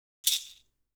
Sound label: caxixi